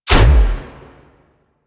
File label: Dash Impact; Powers; Movement; Warframe; Focus; Operators; Wraith